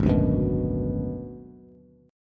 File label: G sharp Minor 7th Flat 5th G sharp down Bass; G#m7b5 strummed; JGuitar